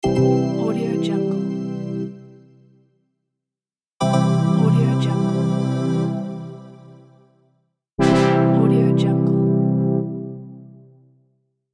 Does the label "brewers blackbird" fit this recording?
No